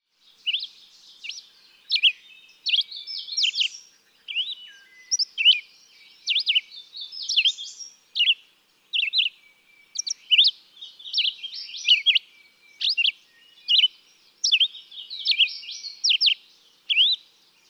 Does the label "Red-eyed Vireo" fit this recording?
Yes